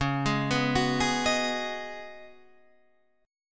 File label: Db 7th Suspended 2nd Suspended 4th, Guitar, Standard; JGuitar